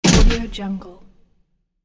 reverberant latch hit; industrial sounds

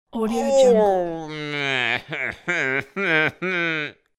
male lost moan; human sounds; ناله مرد گمشده

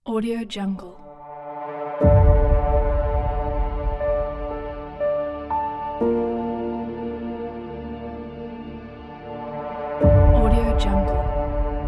epic trailer; موسیقی بی کلام; تریلر حماسی

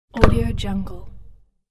plastic resonant hit; industrial sounds

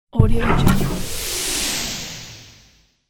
alien geyser deploy; futuristic; شلیک موشک لیزری در فضا

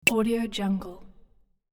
interface short percussive glass; interface sounds; زنگ ضربه به شیشه

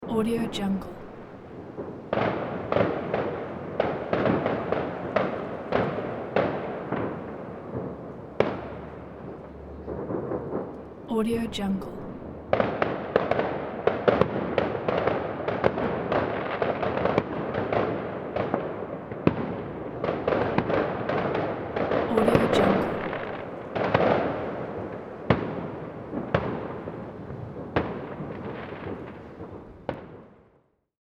fireworks urban intensive distant bangs; urban sounds

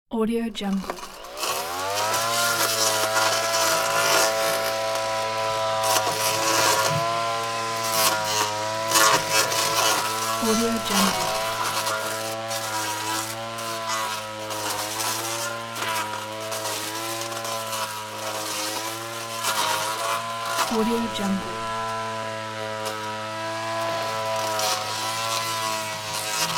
cutting undergrowth with blade mower; industrial sounds

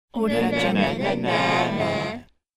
funny slow mocking group

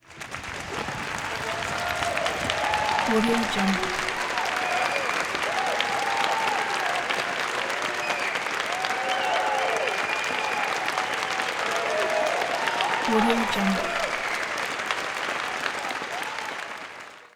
crowds theater applause theatre 500 people cheer; تشویق و سوت و کف تماشاچ; human sounds

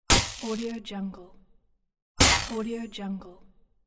energy projectile hit; futuristic sounds

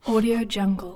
male breathing various irregular breathes; human sounds

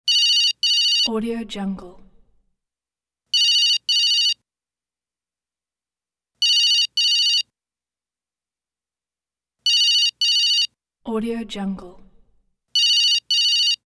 old digital cell phone ring; industrial sounds

